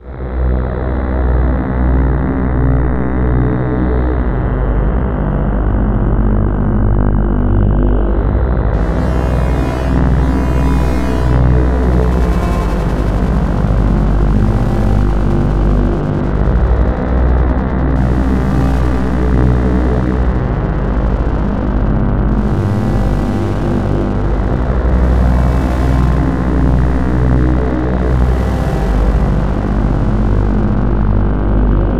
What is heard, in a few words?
ominous; blindside